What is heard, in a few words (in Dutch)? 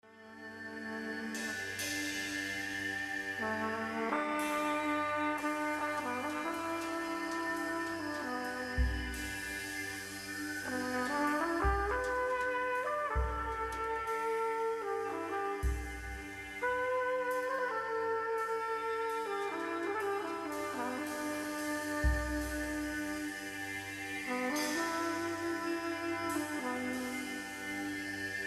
Trompet; boeddhisme; Sogyal Rinpoche